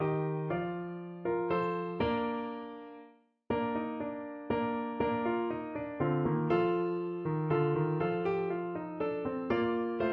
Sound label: Clarinet